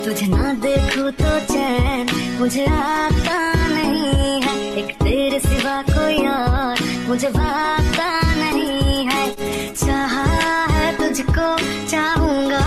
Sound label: music ringtone, bollywood ringtones